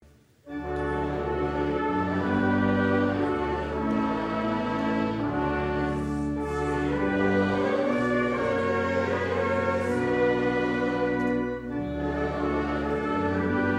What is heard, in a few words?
trumpets